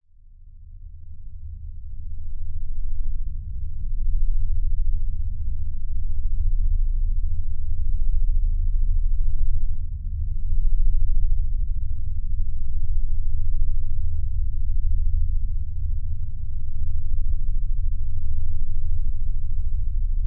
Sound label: science fiction space atmosphere drone rumbling airy outerspace